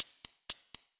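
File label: Ambience Partymode Crowd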